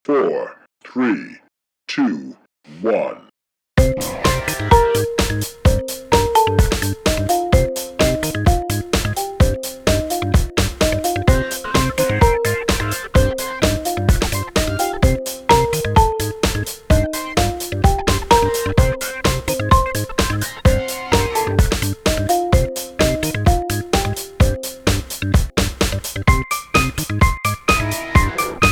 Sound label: music